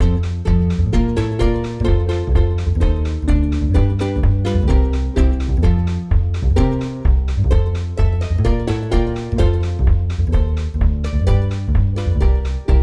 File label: gameplay music loop; SpongeBob Patty Pursuit